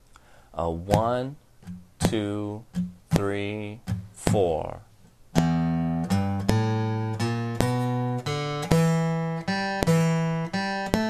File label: group 2 licks, blues guitar